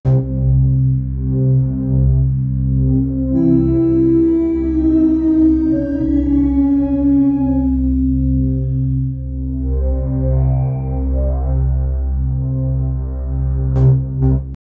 eerie anticipation music

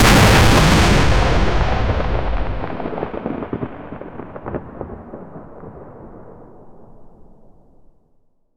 massive explosion with debris flying everywhere

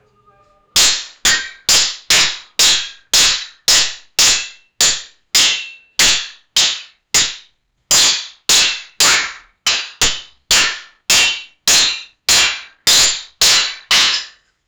two people sword fighting

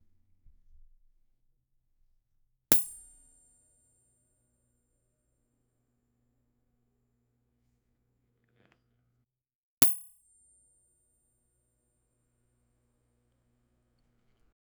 lightbulb breaking

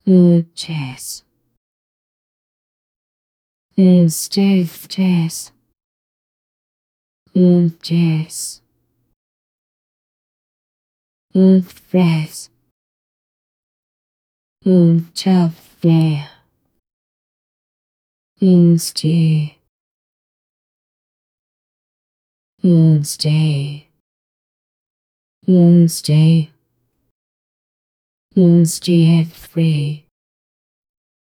woman saying psssssssssss

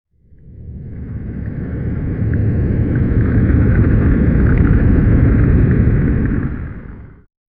a geyser erupting with a powerful burst of water

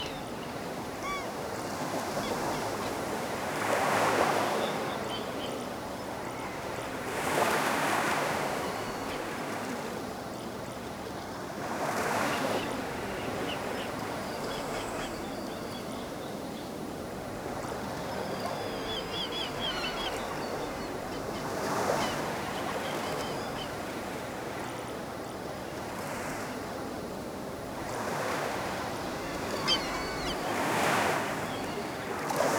light ocean waves lap ont

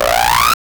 a short energy fuze elect

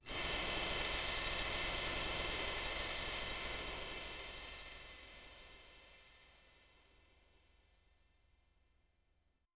magical fairy appearing in a shower of sparkles

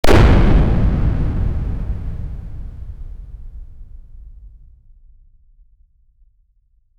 small controlled explosion in an enclosed space

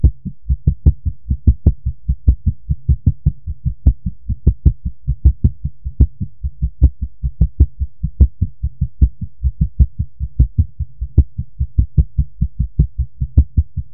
heartbeat beating slowly

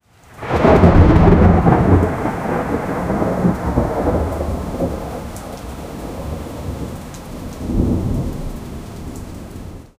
thunderstorm with rolling thunder and heavy rain

